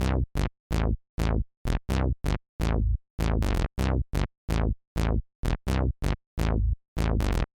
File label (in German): synth bass harmonische fuelle